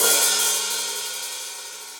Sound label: Hihat Open Shank BigPunchy; Drum Hits; Standard Hits; Ableton; Factory Packs; Session Drums Club